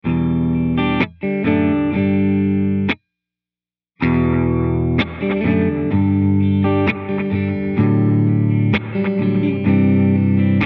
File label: Delay Single Tap Triplet Delay